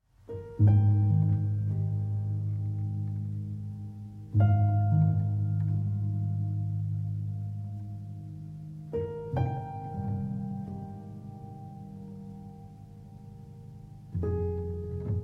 Nocturne in E-Flat Major, for Piano, Violin, Cello; Op. 9, No. 2